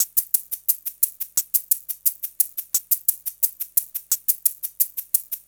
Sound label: PRCLP, Percussion Loops; neuro; Stanza; Drums